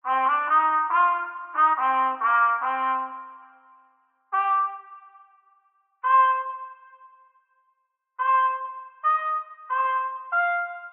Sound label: Trumpet Cave; LISA: The Undone